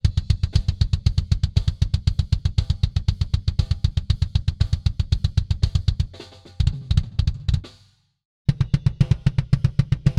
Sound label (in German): drumset double bass vergleich mit akg d112 mkii kick drum; img stageline